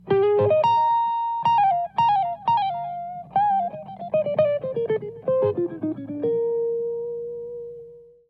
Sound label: Riff